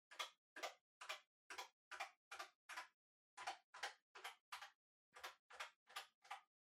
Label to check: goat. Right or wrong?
Wrong